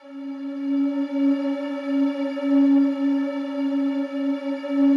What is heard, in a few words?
slow strings C#3, Yamaha VP-1; Music Store; 90's Sample CDs